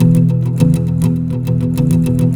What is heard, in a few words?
Acoustic, Guitars; ACID MUSIC